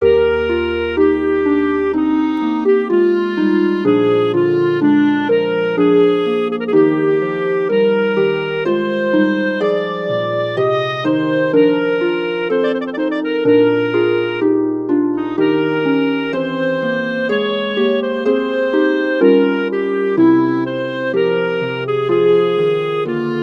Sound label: ave verum for clarinet harp; lemmens jacques nicolas